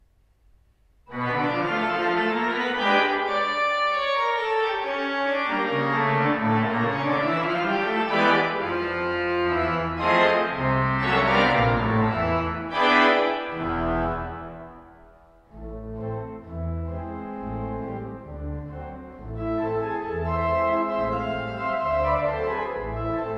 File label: Ragtime for organ